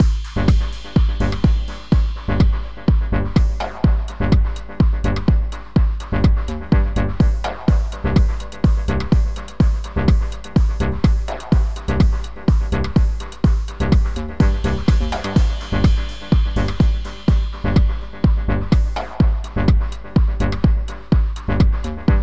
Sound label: progressive house collection; solidtrax